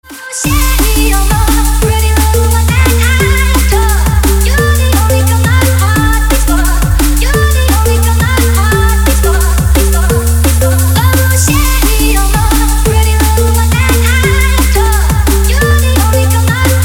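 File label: dnb explosive ringtone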